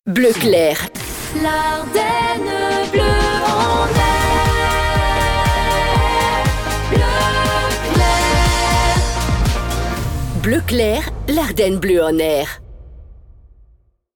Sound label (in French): Jingle Chante Theme ID; BleuClair